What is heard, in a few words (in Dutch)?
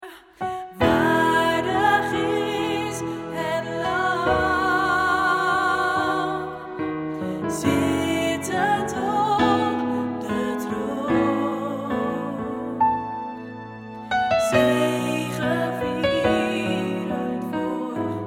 Waardig is het Lamb Alt, vocale partijen choir; Presence op het Malieveld